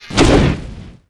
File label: Bow Fire Cast; bullet